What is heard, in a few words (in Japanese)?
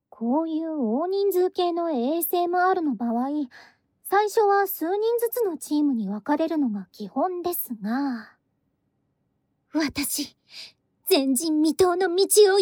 ハーレム耳かき（右）, ASMR